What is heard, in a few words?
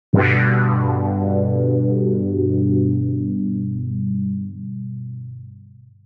Rez Pad, jup8; Aphelion